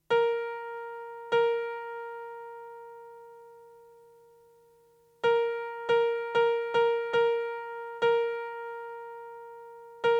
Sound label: "rhythm" sequence; corresponding to performance 1